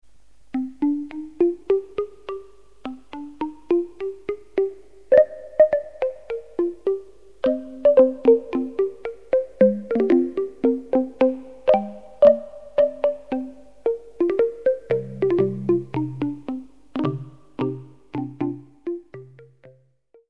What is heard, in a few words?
wood, polykorg, synth; jurgen Haible